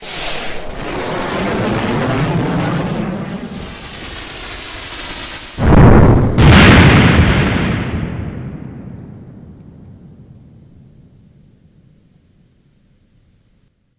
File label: Push the button, Missile Explosion